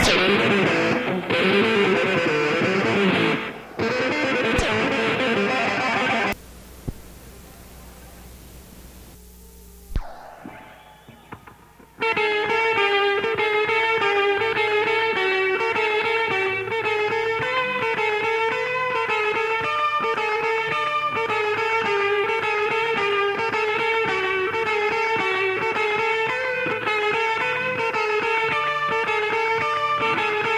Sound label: Guitar Solo, Live; Lyceum Theatre, London, UK